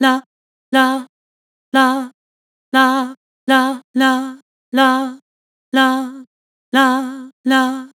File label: Vibrato "Lah", Harmor Vocals